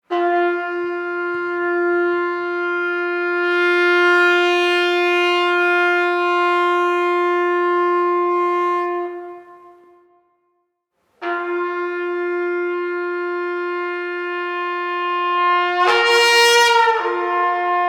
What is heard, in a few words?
Tromba